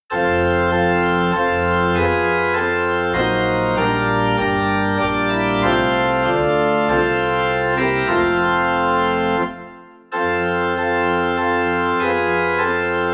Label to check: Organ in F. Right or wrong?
Right